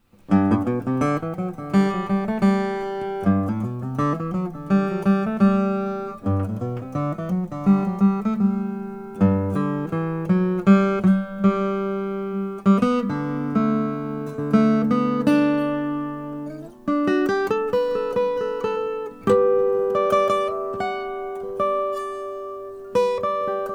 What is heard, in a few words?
gibson lg 2 sunburst